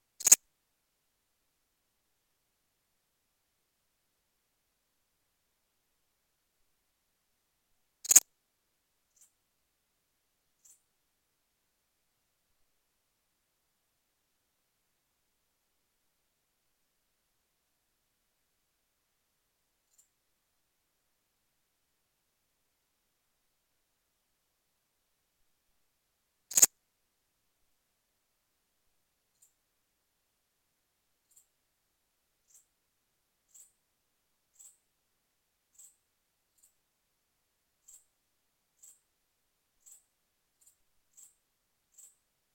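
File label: Pholidoptera transsylvanica laboratory 23°C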